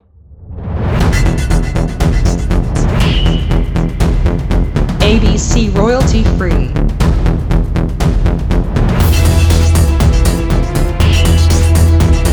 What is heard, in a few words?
Industrial Techno